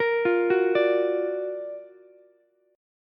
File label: F sharp Augmented Major 7th F sharp Ukulele; arpeggiated; JGuitar